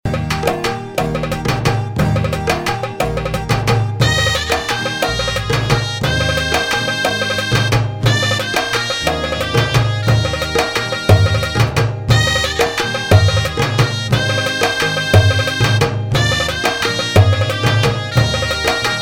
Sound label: Karaoke Song